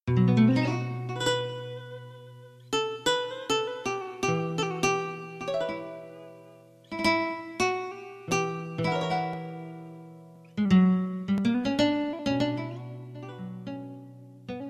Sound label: Spanish Lick; Punjabi